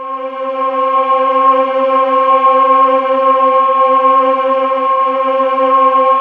JV TRON CHOR; VINTAGE-EXP

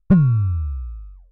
Synare Filter; Star Instruments Synare 3

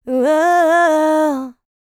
LICKS, Vocal Planet, R&B; ILIO; Dance; LT R+B

